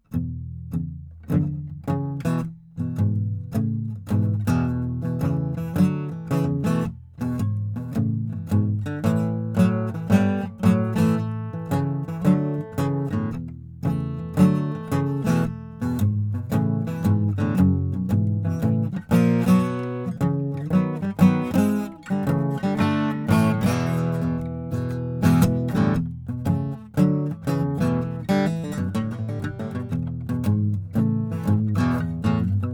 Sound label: Blues In E; Yamaha FG710S flattop